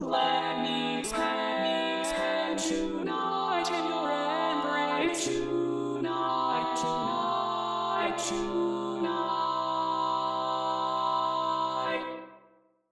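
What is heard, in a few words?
Baritone, barbershop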